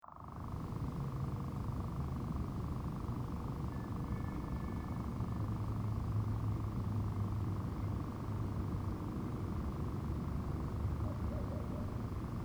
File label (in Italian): domenica notte fischio sicurezza; petropolis